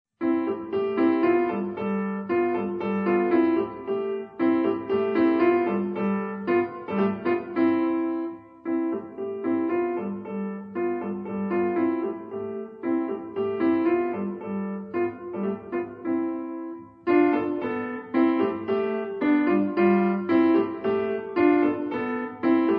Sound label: hummer polka